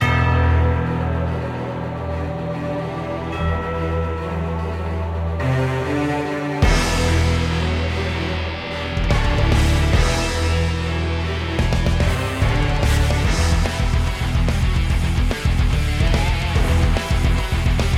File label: boss battle metal loop; SilverStudioGames